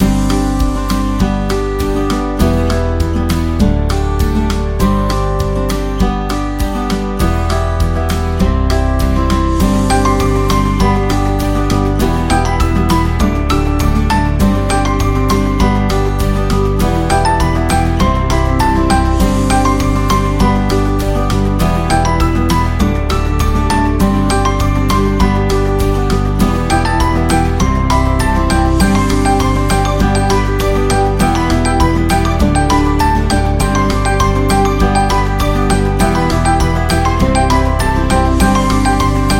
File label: Birthday Loop